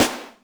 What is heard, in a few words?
snaredrum, Yamaha RM 50